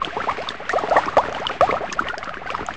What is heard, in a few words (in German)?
kochen